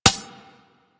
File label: Foot Stab; Enemies; Abilities; Warframe; Corpus; Arachnoid Hunger